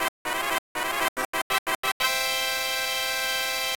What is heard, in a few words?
Jingle Title call; Kaitou Rousseau